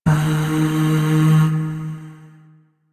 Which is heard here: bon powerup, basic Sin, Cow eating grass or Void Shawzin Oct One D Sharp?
Void Shawzin Oct One D Sharp